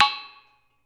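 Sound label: PANARUS, Metalophones, Pitched Percussion; E-MU Producer Series Vol. 7 – Old World Instruments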